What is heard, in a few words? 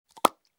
Flapping, notifications; ACE Clever-1